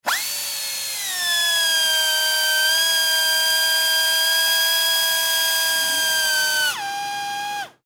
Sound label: industry tool air drill run